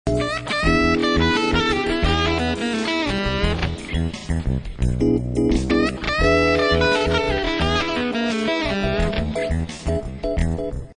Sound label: blues